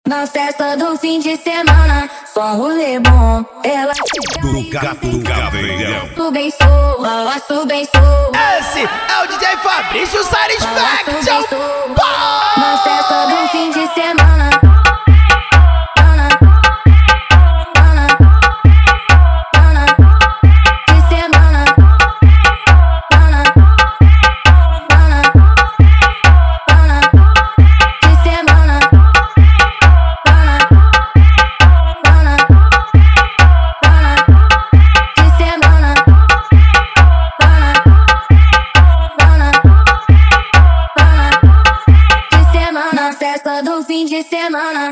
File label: funk mega bass